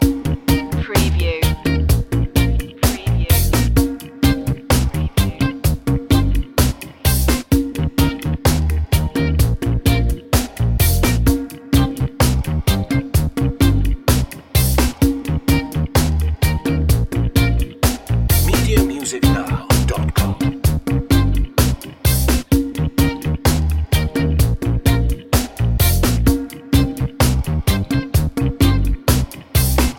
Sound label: reggae thing loop